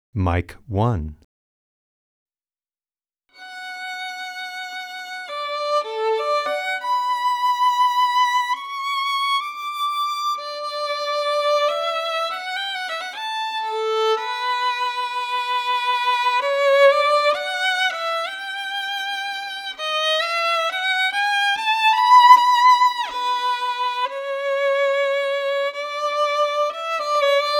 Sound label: Violin